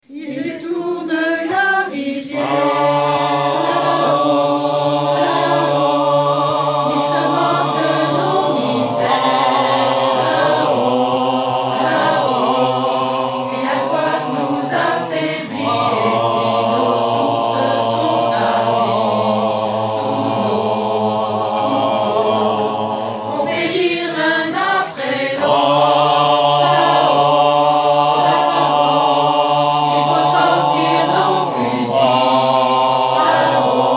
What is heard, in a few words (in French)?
barytons